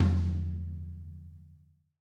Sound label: Tom Mid, acoustic kit